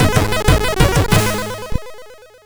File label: Game Muzak, MOS6581R4; Moon